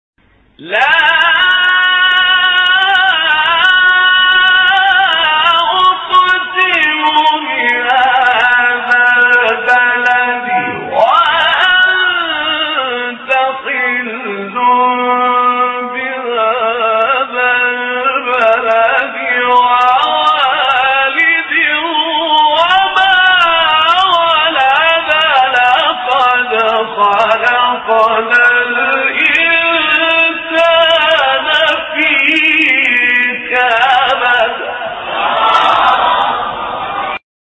تلاوت زیبای سوره; بلد; استاد طاروطی

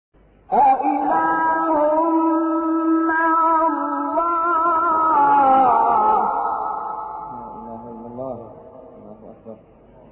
نوای زیبای تلاوت قرآن سوره مبارکه نمل; شحات انور; 62 63

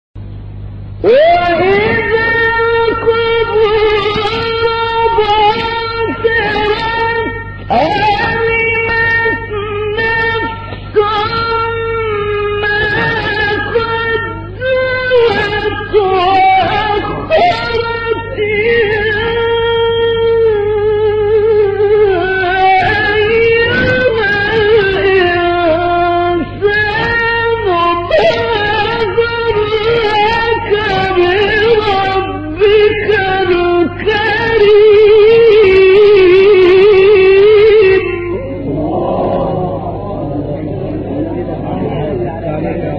تلاوتی زیبا و قدیمی سوره; استاد حسن سالم شاهین; انفطار

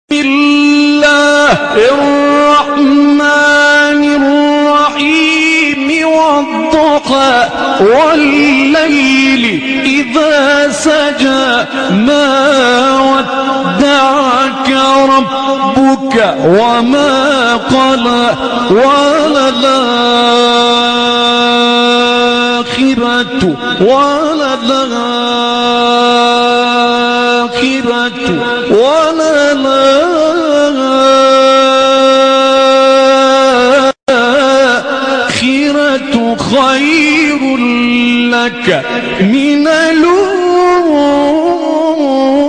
تلاوت زیبای سوره; ضحی

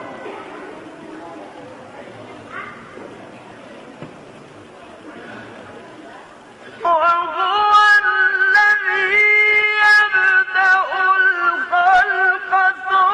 فراز زیبای سوره روم; استاد منشاوی

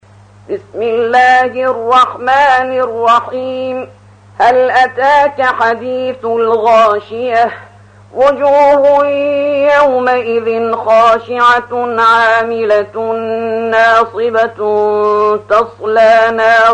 ترتیل سوره غاشیه

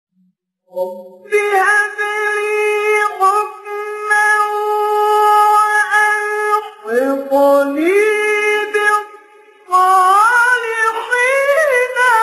تلاوت آیه سوره شعرا; آیه 83 84

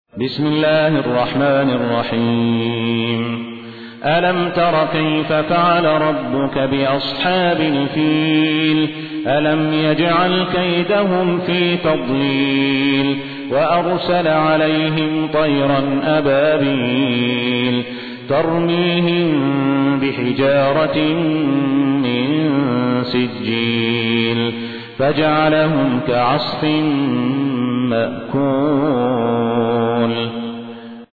ترتیل; صالح الصاهود; سوره فیل

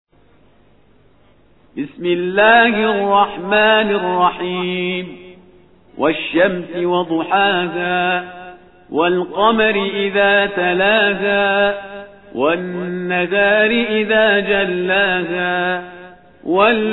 ترتیل سوره مبارکه شمس; شهریار پرهیزگار

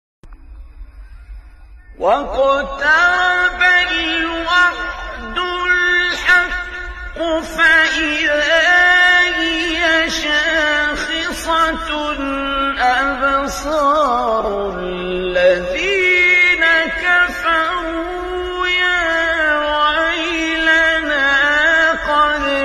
تلاوت آیه سوره انبیا; آیه 97; استاد عبدالباسط

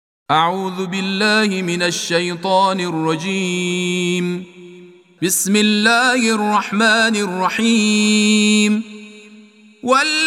تلاوت ترتیل قرآن کریم سوره لیل

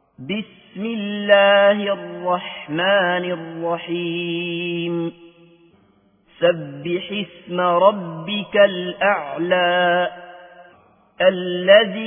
قرآن مجود; عبدالله بصفر; سوره اعلی

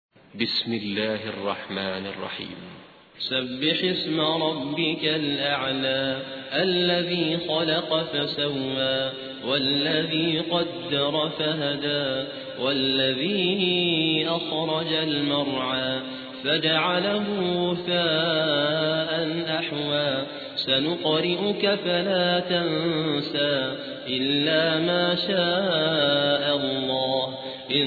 ترتیل قاری سودانی; سوره اعلی

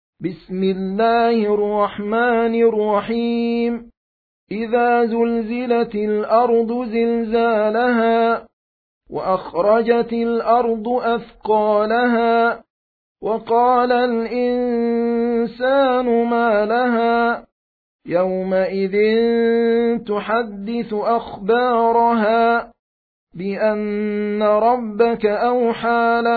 ترتیل; سوره زلزال

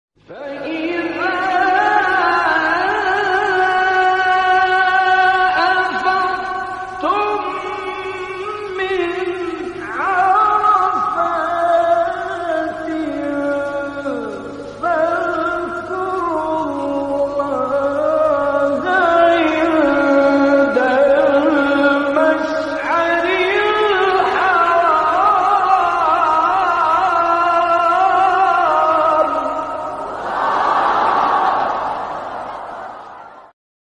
فرازی از آیه سوره; 198; بقره; استاد غلوش

